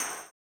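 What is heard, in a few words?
tambourine, 160; Spectrasonics; Vocal Planet; Blues Soul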